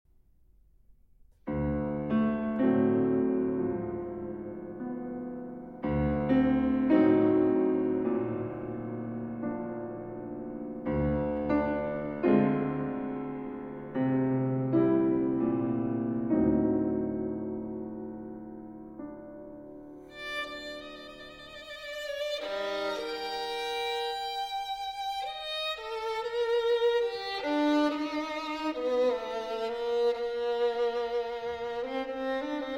Violin Sonata in A Major, Recitativo-Fantasia, Ben Moderato